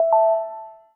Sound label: Chime